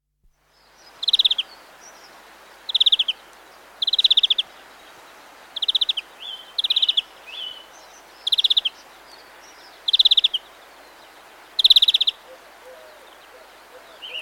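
Greenfinch, with mistle thrush, collared dove, house sparrows, and skylark in background; Bird; Bird Sound Effects; BBC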